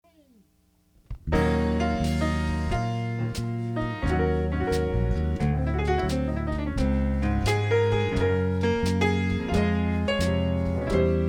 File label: (TRIO)